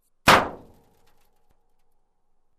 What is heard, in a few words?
Fell off the Roof Sound